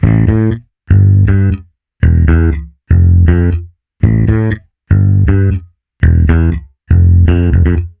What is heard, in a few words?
Bass Guitar 120BPM Am; 99Sounds x Monosounds; Instrument Loops